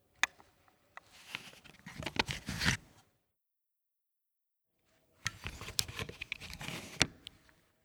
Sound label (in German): Gartenset Schere raus rein